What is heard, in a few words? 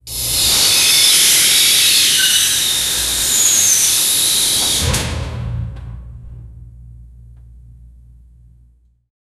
a metal air conditioning